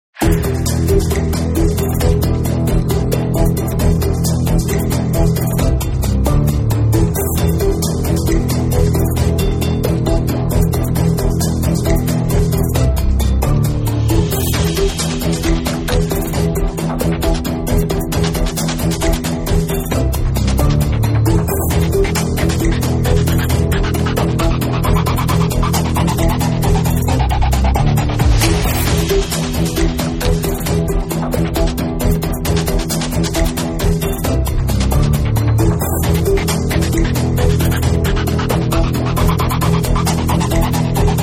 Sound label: music round